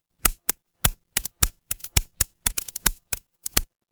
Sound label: fire electric crackle